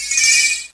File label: Jangle